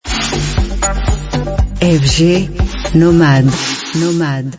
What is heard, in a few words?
jingle; fgnomade